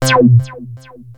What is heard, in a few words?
Access Virus B C3; Synthworx